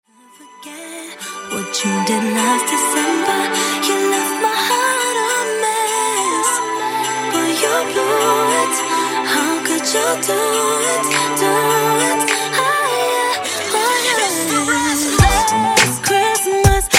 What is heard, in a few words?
Christmas Redrum 103 Bpm Clean